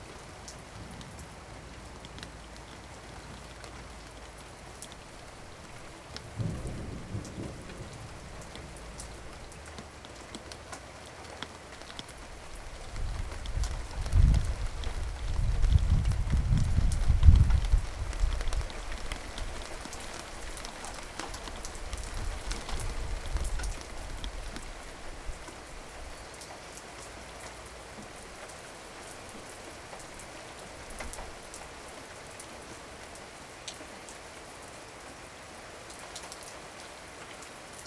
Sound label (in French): Orage